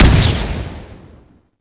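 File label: Projectile Impact; Duviri; Incarnon Dera Vandal; Weapons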